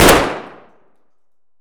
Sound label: saiga indoor close; Escape from Tarkov